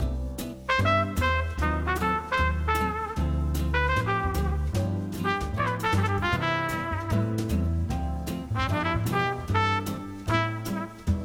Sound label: Perfect Elevator Background Music; The Elevator Game